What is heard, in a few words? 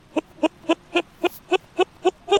laugh reversed